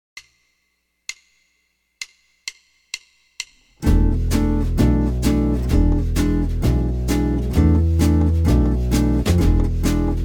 Suspended Animation E Major, jazz guitar

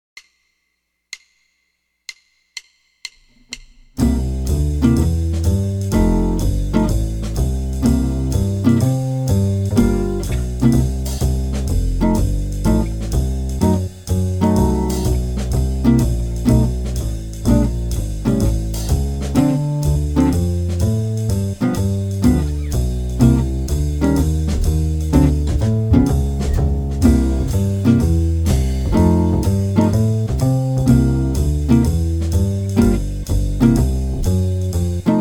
Comping Rhythms on 12 Bar Jazz Blues, jazz guitar

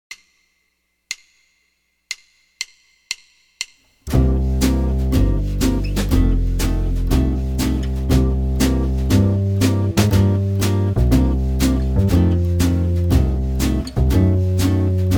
Jazz Blues C Major Root 5, jazz guitar

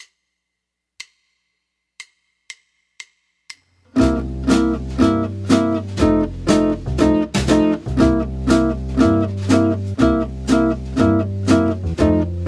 12 Bar Blues Basic, jazz guitar

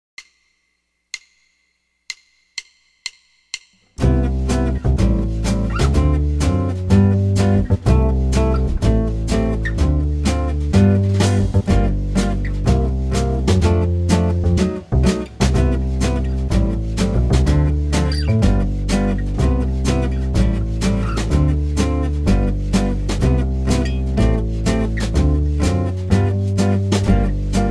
32 Bar Song Form Rhythm Changes, jazz guitar

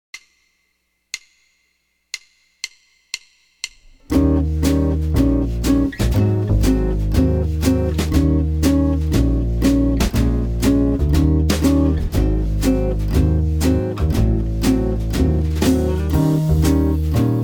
Jazz Blues Eb Major Root 5, jazz guitar

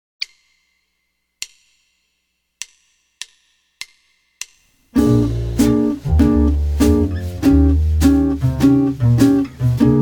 Bb Major Root 5, jazz guitar

